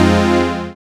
SYN, dance; Sound & Vision - Gigapack II

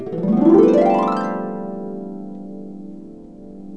HARP GLISS

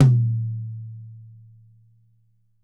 YAM 8X12, Drums; Big Fish Audio; Ross Garfield Drums VOL-2

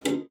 PLOK C#2, spinet; Xsample VOL-7

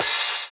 VINYL OH, Drums Dance & Groove; USB Soundscan vol.11; AKAI; VINYLKIT

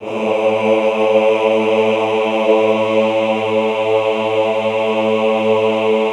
AHH A1 L, Classical Choir; Best Service; Extended Classical Choir; AHH LO+HI LL

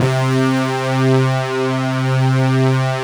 SD1 FAT, Synthesizers, synbrass

